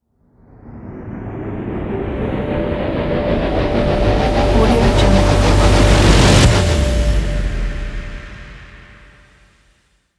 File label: impact riser